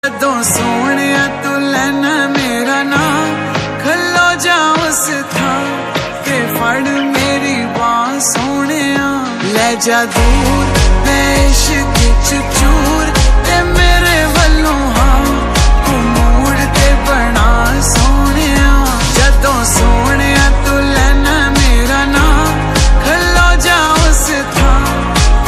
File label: punjabi song ringtone slowed reverb